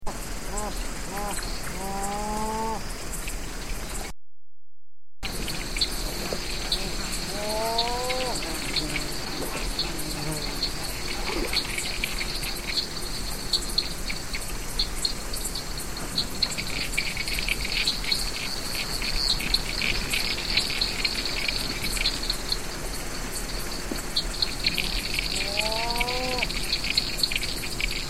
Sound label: rufescent tiger heron